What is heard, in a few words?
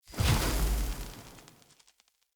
Casting Device Blast, Weapons Tech; Enemy